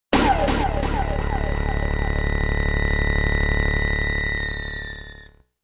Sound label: clock tower; bath